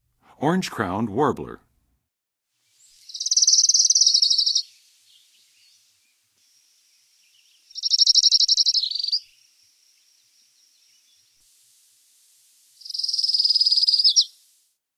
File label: Orange-crowned Warbler, Songs of Birds of North America; Western USA; Western USA Songbirds